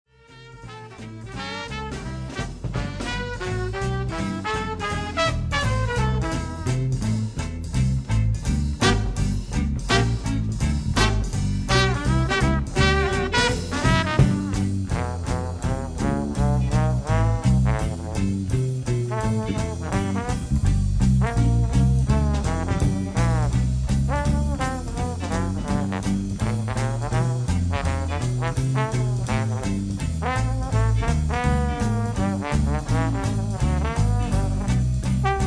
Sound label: slapping, trombone